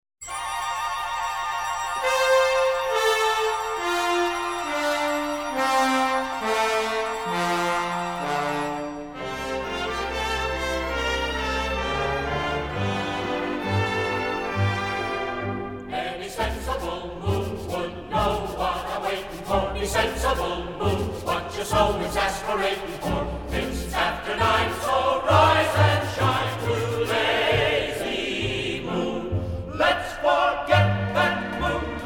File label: lazy moon vocal